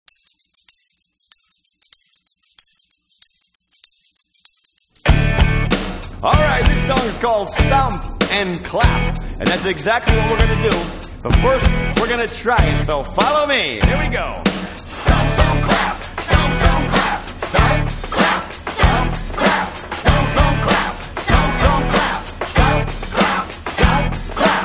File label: stomp and clap